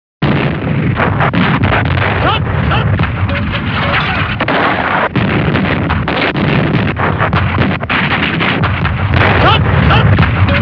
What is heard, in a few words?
battle